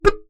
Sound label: Melodica Short Note